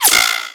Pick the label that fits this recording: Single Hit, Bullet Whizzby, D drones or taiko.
Bullet Whizzby